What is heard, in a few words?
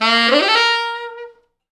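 tenor sax runup, A#3; East West; Quantum Leap Horns Sax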